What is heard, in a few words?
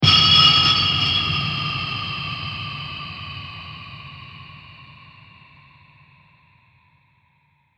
Scratch Hit With Long Reverb; Blasts; Impacts; Crafted Signals